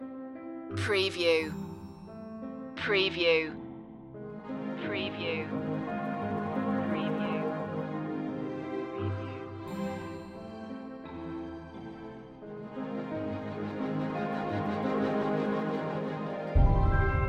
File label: warm summer day loop